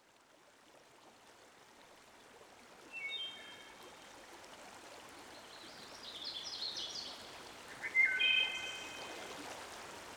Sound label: wood thrush; dan gibsons solitudes